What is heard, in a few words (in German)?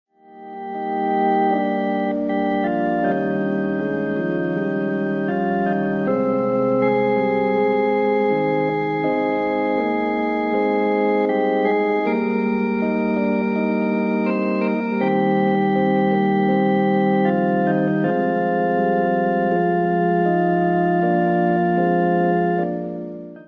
spielt harmonium; kantor